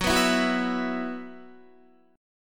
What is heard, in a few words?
Gb 7th Suspended 4th Gb down Guitar, chord